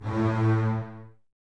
bass A 2, String Ensembles, Basses, Detache; Miroslav Vitous; 90 s Sample CDs

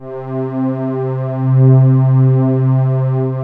JD800 PULS, PAD; Alpine Volksmusik

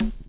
DR. LOOP; X-Static Goldmine 3

